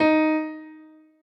piano o5dp

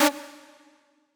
synth

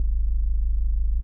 Sylenth1

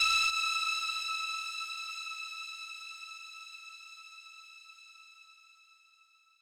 lead

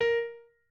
piano

